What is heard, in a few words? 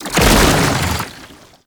Tank Infested Melee Charge; Enemies; Abilities; Lasrian Tank; Warframe